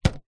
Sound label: leisure toy inflatable hammer hit impact